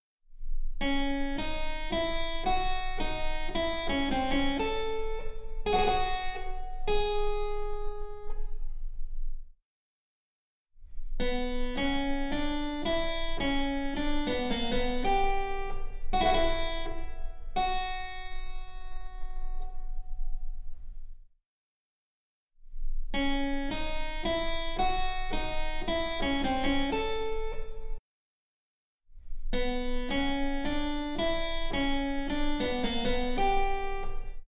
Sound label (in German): Cembalo; Mark Lindley WTC 1